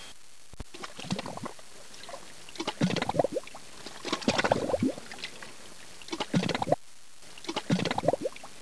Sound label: Water, 自然, 水声; 特酷声音素材库